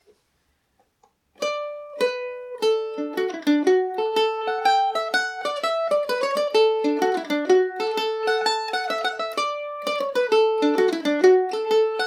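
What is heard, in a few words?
gleanntan slide revisited D major, mandolin; The Gleanntan Slide; G&O #34 mandolin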